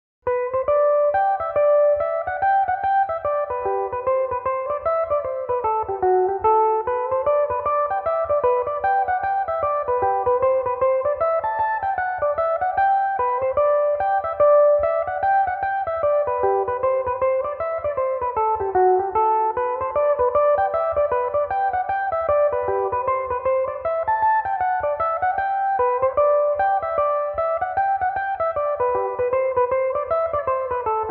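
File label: reel, G major, mandolin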